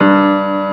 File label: PIANO G1; AKAI S6000 CD-ROM - Volume 1; PIANOS